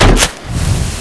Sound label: stickybomb plant; Spearhead Demo